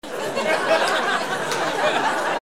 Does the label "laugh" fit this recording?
Yes